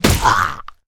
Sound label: zombie hit; DeadFright